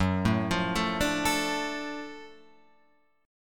Gb Minor Major 7th Sharp 5th Gb down Guitar Open F; arpeggiated; JGuitar